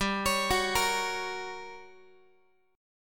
G Minor Major 7th Flat 5th G, Guitar, 4A, 4E, 5A, 5D, 5G sharp, 6C sharp; arpeggiated